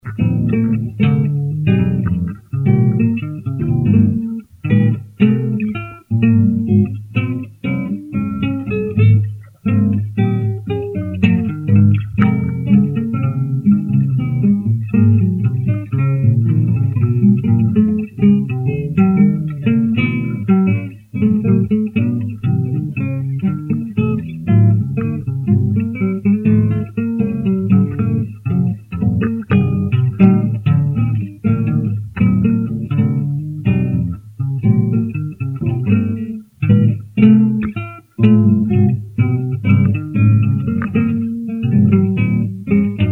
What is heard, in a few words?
Descending 5ths